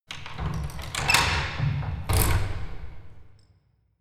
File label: locking church door